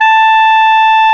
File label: Clarinets; Roland L-CDX-03 Disk 1; Clar Cls